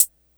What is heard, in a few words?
focused pedal hi hat sample c key; tuned drums